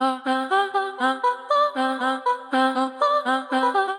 Hocketing Rack C Lydian 120 bpm; MIDI Clips; Ableton; Factory Packs; Voice Box; Harmonic Racks

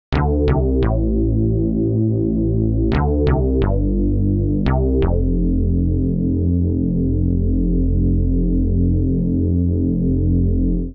Bass A Minor 86bpm; Bassline; Tonal; MIDI Clips; Drive and Glow; Ableton; Factory Packs

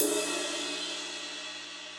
Ride Edge Dark Plate Verb; Drums; Drum Hits; Standard Hits; Ableton; Factory Packs; Session Drums Club

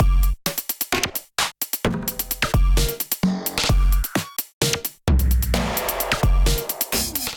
Kit groove 130 bpm; MIDI Clips; Timefold Kit groove; Ableton; Factory Packs; Drum Essentials

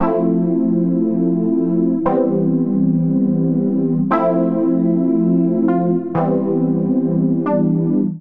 Keys C Major 117 bpm; Accompaniment; Tonal; MIDI Clips; Past; Drive and Glow; Ableton; Factory Packs

